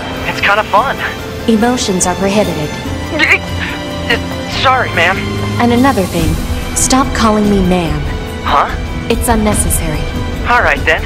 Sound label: applause; 2B or not 2B